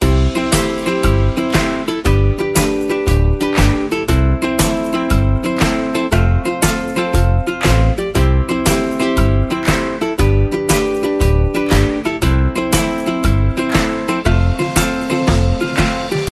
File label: music Happy; orlamusic